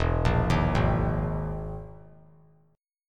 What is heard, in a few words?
E Major 7th Flat 5th down; EM7b5 arpeggiated; JGuitar